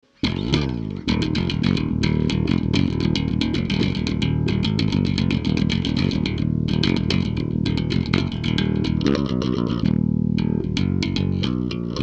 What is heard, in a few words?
Mixing layered Bass Layered Tone